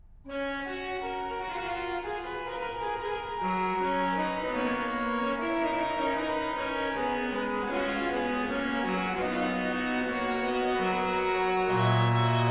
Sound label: Fugue; Chatenois